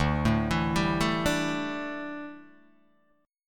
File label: D Suspended 2nd Suspended 4th D down Guitar Open D